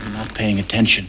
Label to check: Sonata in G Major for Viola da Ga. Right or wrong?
Wrong